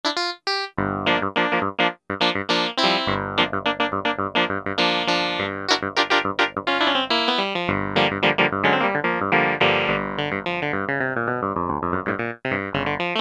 Clavichord, Roland Juno-60; Factory presets

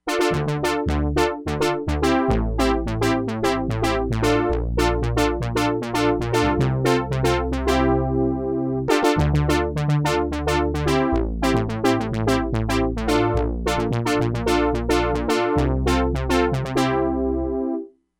BRASS, Crumar Bit 99; Factory Programs

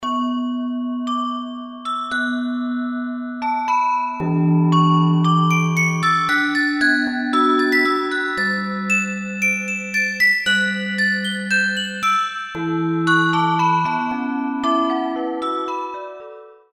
Bell Foundry; Combinator; Propellerhead Reason 3.0

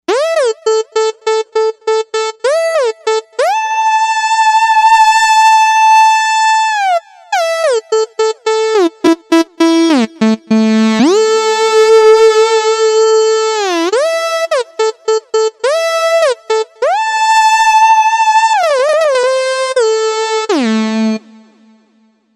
LeadLine, Novation UltraNova; Factory Patches

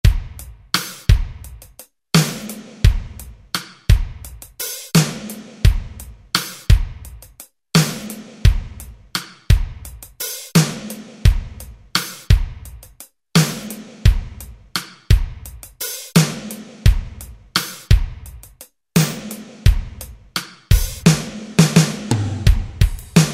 Ballad, Alesis SR-16; Preset Patterns

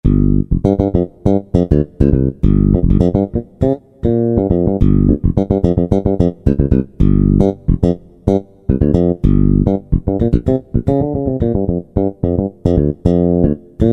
SuperRound, Korg N364; Factory Prog demos

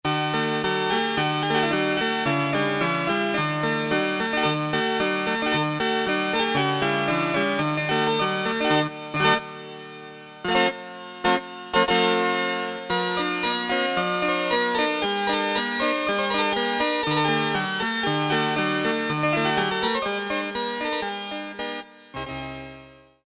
harpsichord, Casio MT-500; Tones

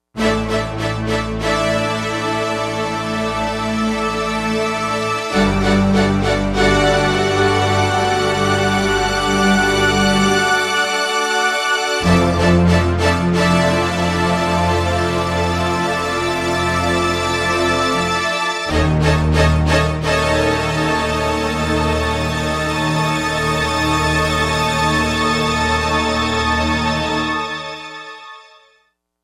FastSlow; Digital Synthesizer

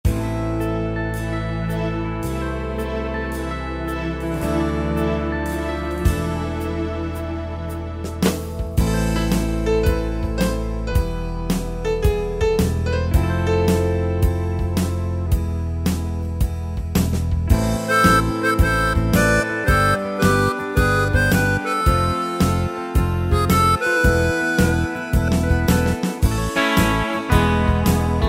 Brit Pop Swing, Yamaha PSR-3000 pop & rock style

